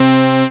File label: SYN SH, Roland; Sound & Vision - Gigapack I CD 2